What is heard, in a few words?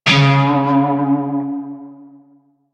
Shawzin Flourish Oct Two C Sharp; Music; Warframe; Narmer Shawzin